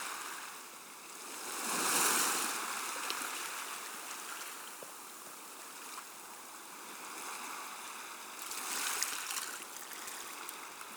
Seawaves; Funk of Titans; PS Vita

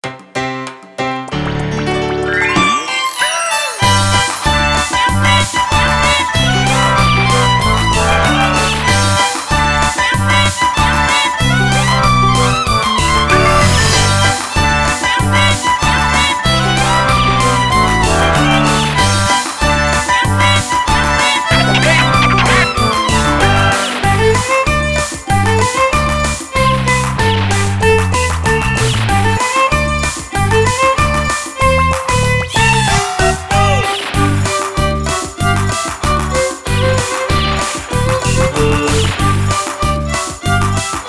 Melody line; Gangsta Republica

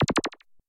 Shield Off Hit

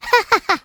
Caramel Miss Sound; Jolly Battle

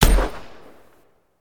chopper shoot

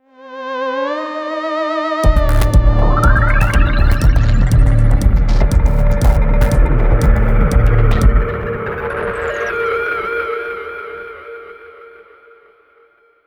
Round Start; Moon

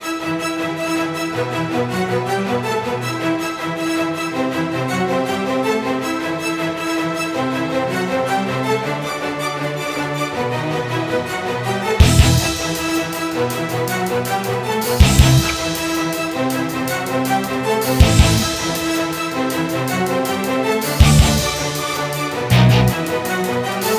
BGM Phase 3 Battle Intro; Fallen Knight

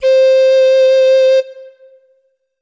Instrument samples; peppa pig world adventures

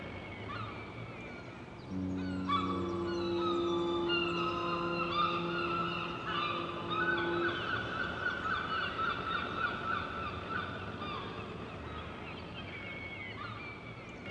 Amb Harbour

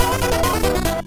music save; Skellboy

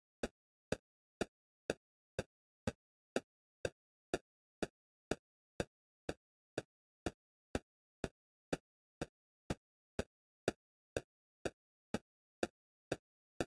PERC

